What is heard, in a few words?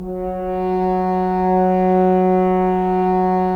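Solo French Horn, Brass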